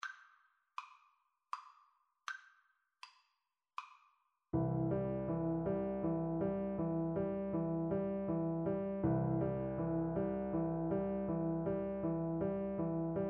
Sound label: Piano Duet